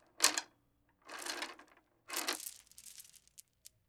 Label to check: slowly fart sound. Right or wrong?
Wrong